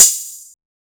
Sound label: Hat Accent, 606; classic machines